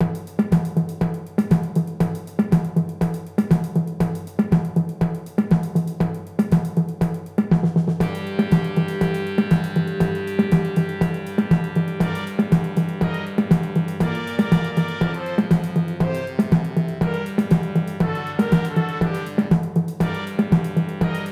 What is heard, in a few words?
samba perc